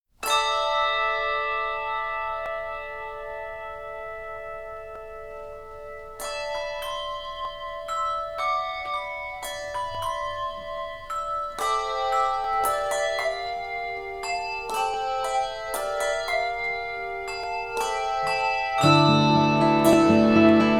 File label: Guitar